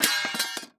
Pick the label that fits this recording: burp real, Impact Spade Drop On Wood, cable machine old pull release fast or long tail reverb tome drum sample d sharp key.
Impact Spade Drop On Wood